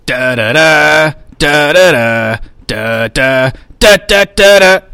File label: crow